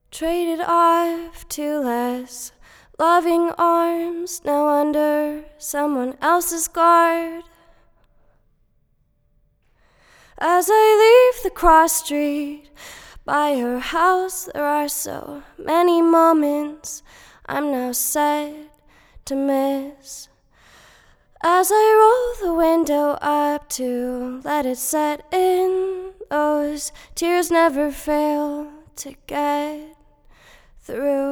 vox tlm103

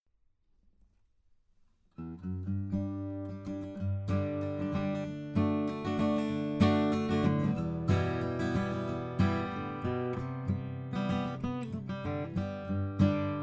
acoustic

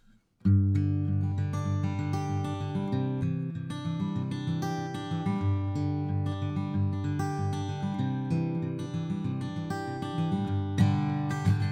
guit

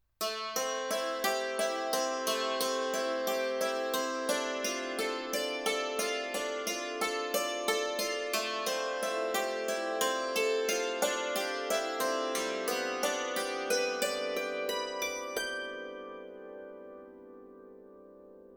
dulcimer

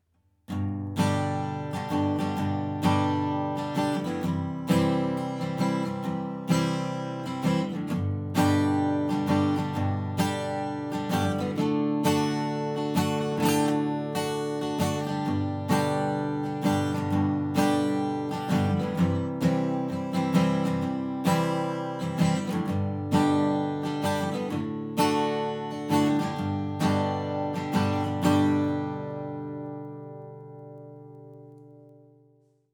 ortf guitar

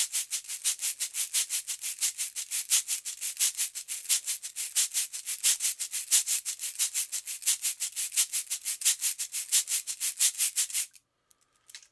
cabasa

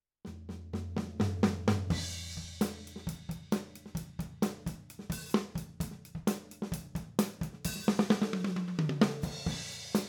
drums; KSM44A

